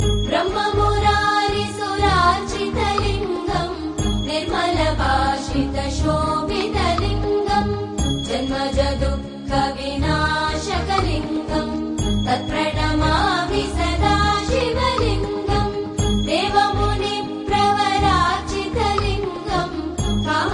hindu devotional

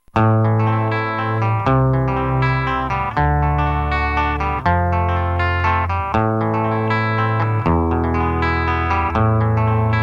samsung

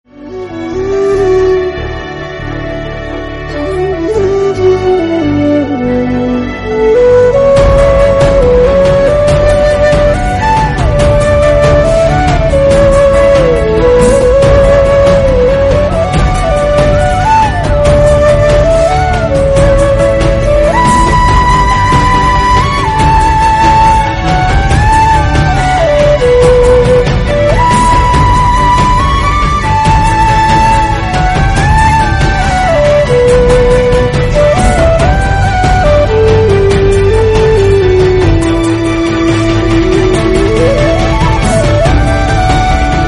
happy birthday background

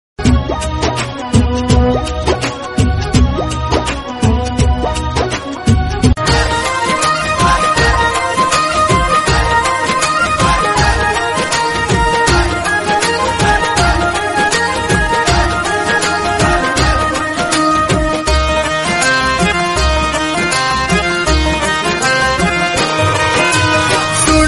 rajasthani song

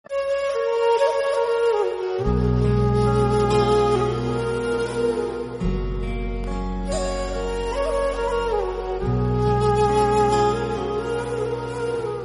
best bansuri, ringtone

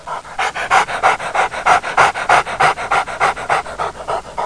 dog sound

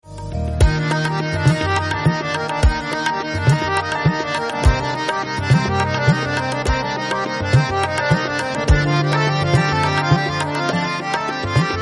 amazing harmonium

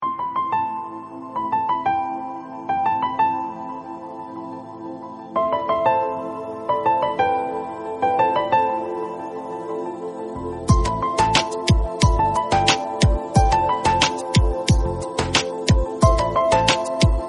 best romantic piano

